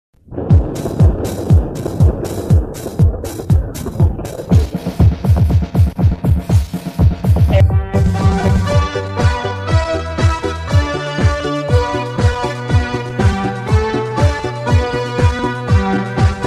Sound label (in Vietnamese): di xe lua beat, nhac beat